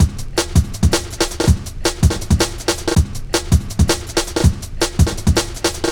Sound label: Loops, breaks; m8 breaks